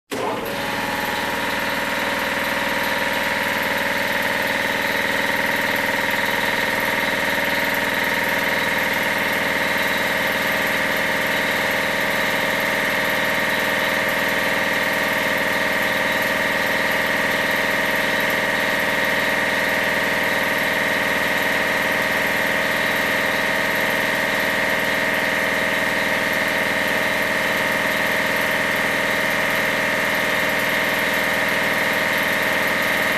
Engine Turbine noise up-close

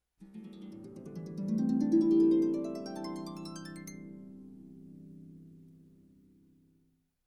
Resolving major harp chord

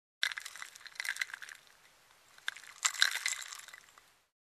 Drink with ice cubes getting stirred

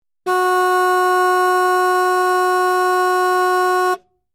Sedan Car Long Honk

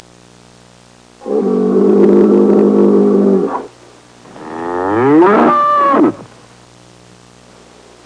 Bull bellowing and mooing

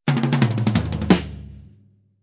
Fast rock intro drum fill

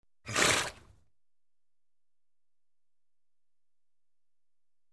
Hippo bellow

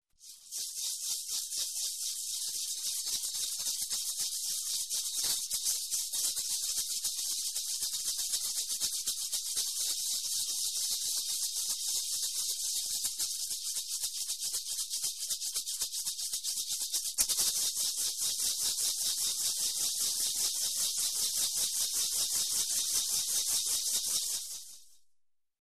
Spinning shaker sound

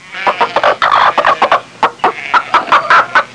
Farm chicken clucking

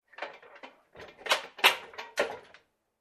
File cabinet rattling

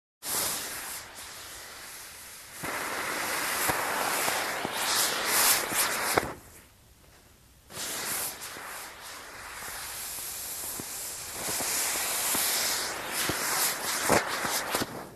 Fireworks being set off

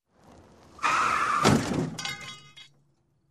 Car Crash

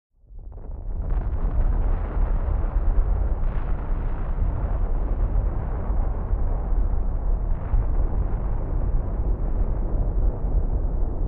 Storm and Heavy wind rumbling

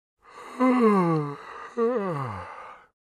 Adult Man Yawning

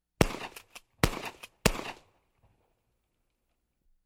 Semi-automatic Pistol Shots

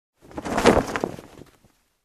Spread out sheet

